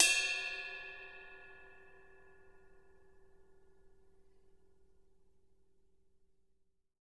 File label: RIDE BELL